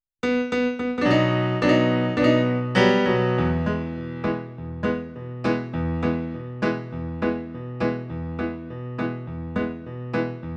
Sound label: accompaniment